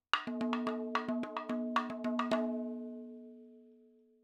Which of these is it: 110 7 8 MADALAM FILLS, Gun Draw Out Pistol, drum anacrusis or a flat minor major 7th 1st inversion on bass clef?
110 7 8 MADALAM FILLS